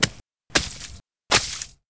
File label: Wet Snowball Hits